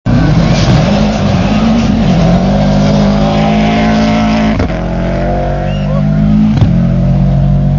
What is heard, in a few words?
Rally engine